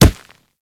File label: Kubrow Run Dirt, Footsteps; Enemies; Warframe